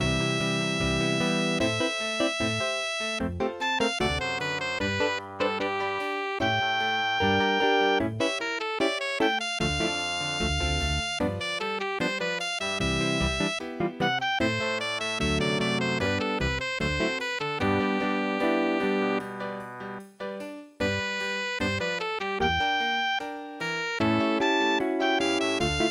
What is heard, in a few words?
string jazz waltz; midi; traditional